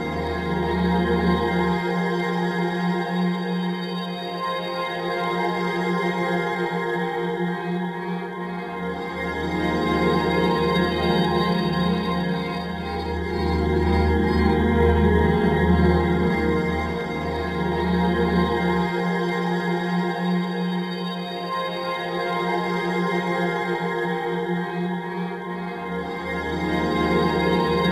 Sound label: Ambient; Cosmos Chaos; NDS